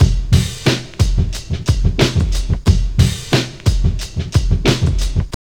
TRIP 90, trip loop

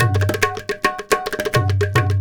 India, Heart of Asia

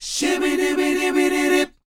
SHOO B E, R&B Dance, vocal; Spectrasonics Vocal Planet

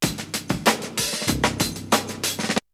Drumloop; ZERO G - Datafile DISC 3

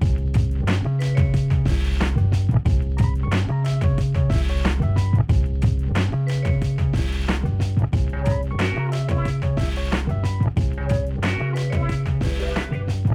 91BPM C, Hip Hop; InVision Interactive; 40 Oz Phat Slammin

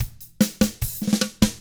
drums, 50s rock; ILIO; Double Platinum Drums 1

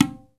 TABLA TONE, Indian Ensemble

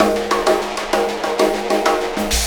Ripple Perc

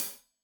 Hat Tight, Hi-Hat, Cymbals; Deeply Sampled Drums

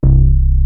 Sub Sonic Bass C2; E-MU Formula 4000 Series Vol. 1; Hip Hop Nation

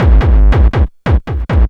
DR909 140, Hard & Loud Techno; USB Soundscan vol.01; AKAI

